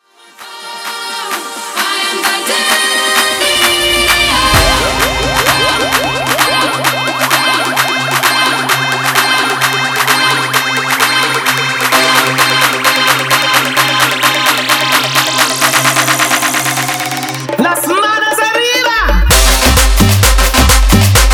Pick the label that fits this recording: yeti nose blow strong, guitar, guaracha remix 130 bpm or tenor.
guaracha remix 130 bpm